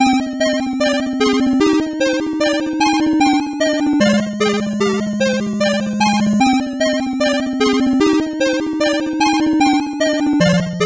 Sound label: 8bit bgm slow